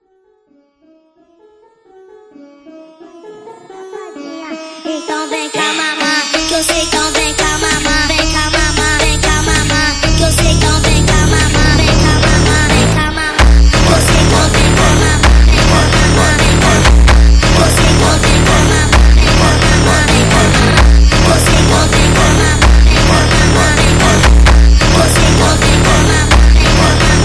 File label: Arabic funk